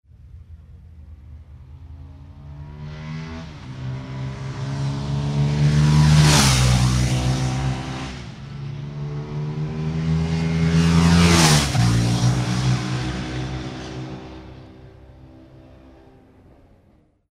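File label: Ducati 998 double; moto; Ducati 998 - passage 4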